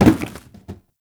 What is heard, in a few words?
Carboard Box Impact; Warframe; Ambience; NineteenNinetyNine